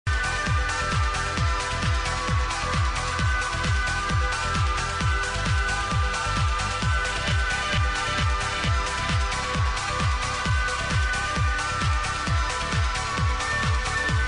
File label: shimmer